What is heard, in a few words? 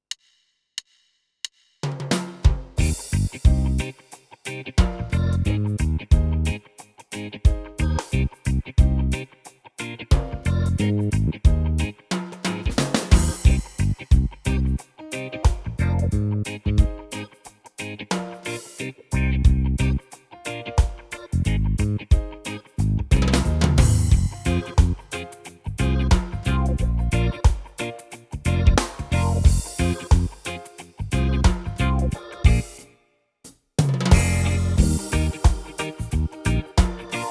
style latin sheriff reggae, yamaha tyros3